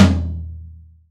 acoustic tom drum e key, tom drum sound tuned to the E note. Loudest frequency: 574Hz